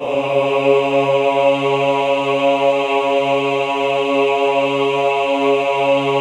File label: AHH C2, choir; Best Service; Extended Classical Choir; AHH MIX